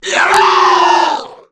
death

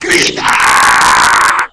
falling

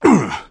fall

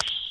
menu

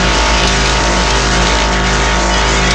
hum

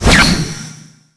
respawn

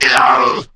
pain

